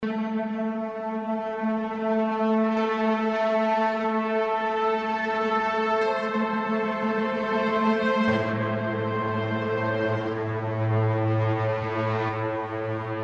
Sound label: Damaged Pedals Triplet FX